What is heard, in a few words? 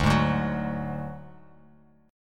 D Major Flat 5th D Bass Standard, chord